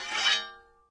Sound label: sword unsheath; smite; Ares